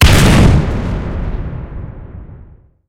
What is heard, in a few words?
tanks explosion; War Thunder